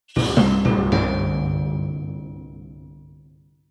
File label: ominous drums; mixkit